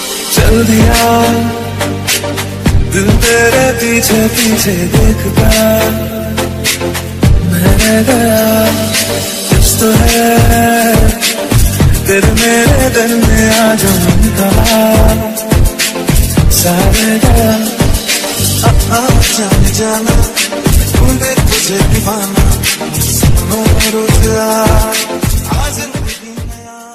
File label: romantic song bollywood ringtone